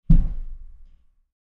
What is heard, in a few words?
Footsteps; Single Female Stomp, From Upstairs.